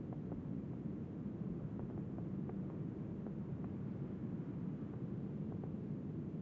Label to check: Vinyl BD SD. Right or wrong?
Wrong